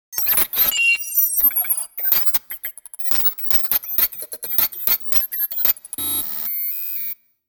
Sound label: Futuristic data calculations long